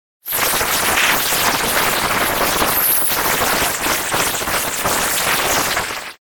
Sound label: Flailing Tentacles